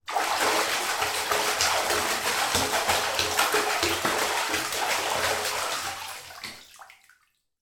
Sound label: household bath person kick feet splash water